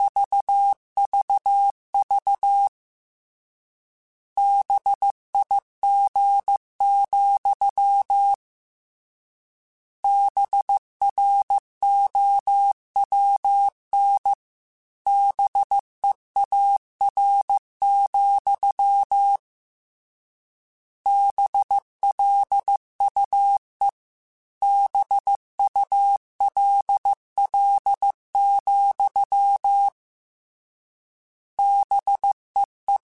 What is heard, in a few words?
Morse Code, CW Code Groups; Mixed